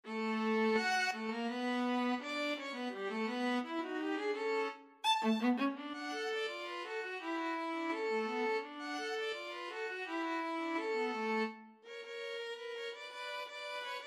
euphonic sounds violin viola

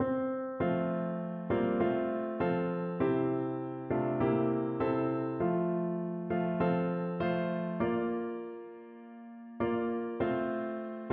piano

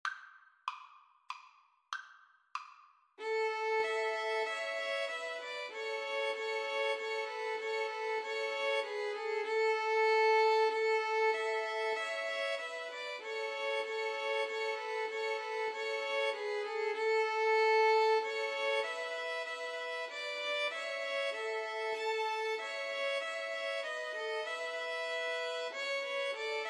Christmas Hymn Violin trio; Slow Playalong; Wyeth, John

